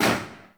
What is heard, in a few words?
Tail Generic Interior Small; Warframe; Weapons